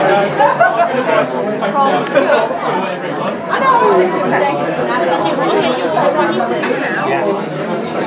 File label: ambient noise at chambers, Folkestone